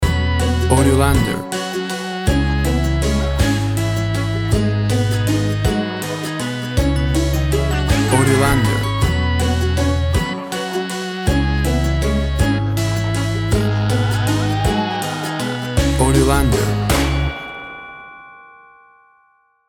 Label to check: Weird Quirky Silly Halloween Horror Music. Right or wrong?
Right